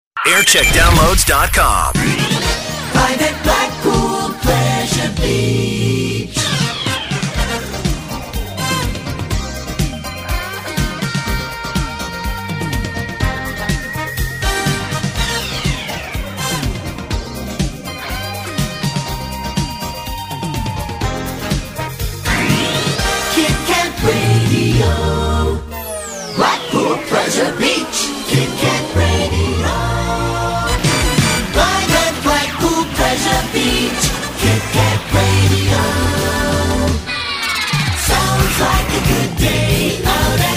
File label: Jingle Montage; Kit Kat Radio; JAM; 1995; 1990s